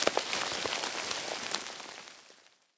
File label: tank impact rocks